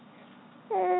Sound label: baby cry crying